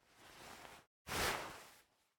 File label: Sword Click; raw game audio files; Mila; MiSide